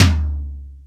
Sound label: XR10 l tom, Akai XR-10